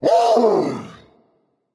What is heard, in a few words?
battlecry berserker; cuchulainn